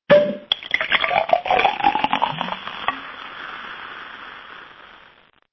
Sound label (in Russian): pop korn koka kola